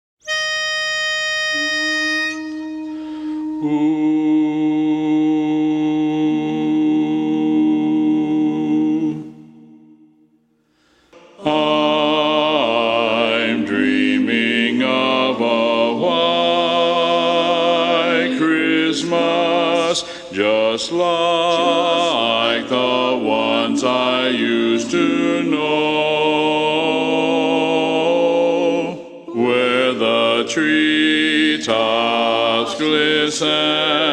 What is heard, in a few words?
shorter bass; mono